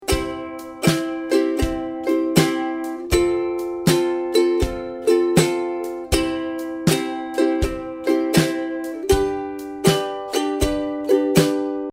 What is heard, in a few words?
strum, ukulele